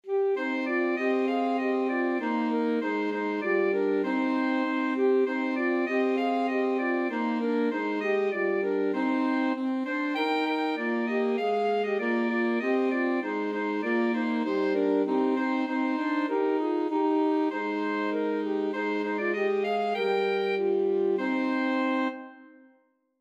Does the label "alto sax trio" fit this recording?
Yes